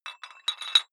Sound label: dumbbell put on rattling weight hit, fitnessstudio